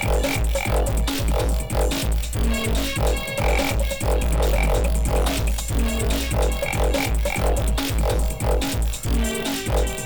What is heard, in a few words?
Livemix Electro; InterfaceRadio